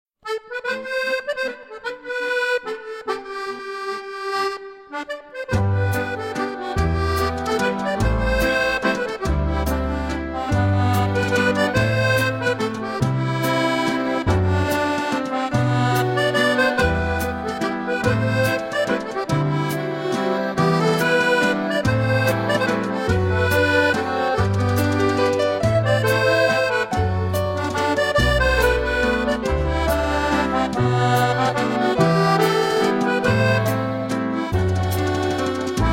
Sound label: Cool down Waltz